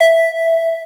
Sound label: Melody Shot E, dubstep; One-Shots; Melodies; Glitter; Ghosthack; Dubstep Sounds 2025